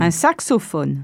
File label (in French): un saxophone